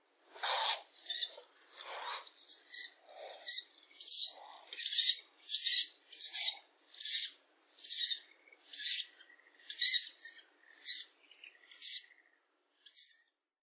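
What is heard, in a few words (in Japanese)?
fukuro, Ural owl; osaka; koe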